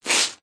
household sponge large wet soapy foamy squeeze squelch; zapsplat